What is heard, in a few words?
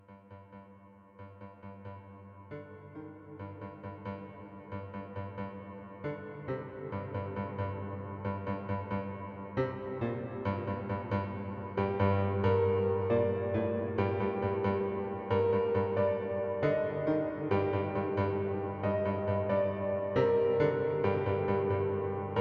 Treasure of the East (piano); Cursed Sight original soundtrack